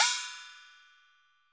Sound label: SF GONG; Sampleheads; NYC Percussionworks; SF PERC VOL1